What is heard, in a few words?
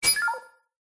science fiction computer short burst noise beep; zapsplat